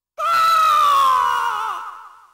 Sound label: screaming losing round; RedDot